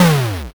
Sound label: explosion s